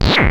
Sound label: Roland Sh-101; Drums